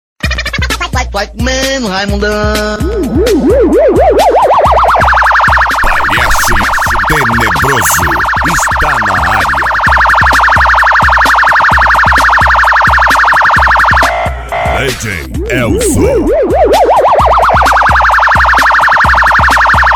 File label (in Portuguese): sirene; palhaco tenebroso e nave do terror